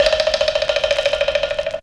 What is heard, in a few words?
TOON; Zero G; Man Machine